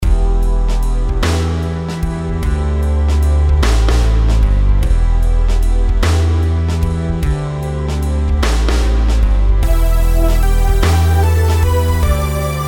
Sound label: rhythms compound 8s